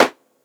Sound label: Snare, drums; Ableton Live 12 Lite Core Library